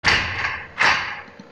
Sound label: door bank